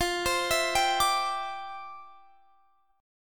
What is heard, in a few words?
C-Major Add 9th F, guitar; arpeggiated